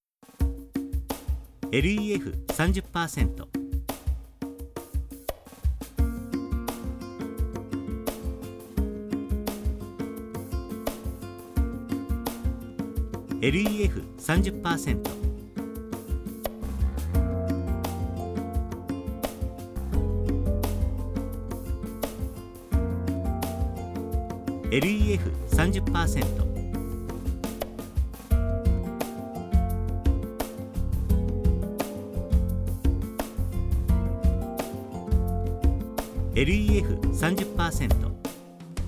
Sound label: perc